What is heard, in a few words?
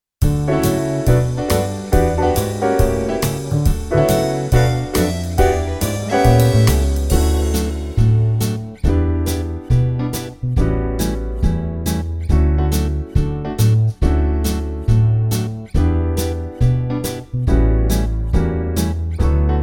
Swing dream